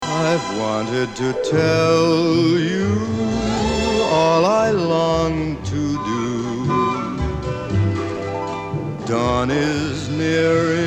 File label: Harmonica